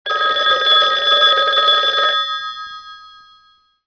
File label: PHONE OLD; VALUPACK